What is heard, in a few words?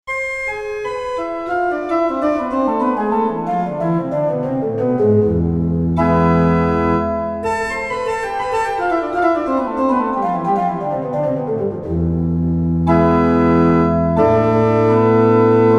Organ, pipe organ